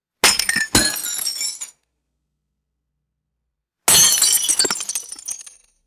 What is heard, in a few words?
thick glass pitcher dropping and shattering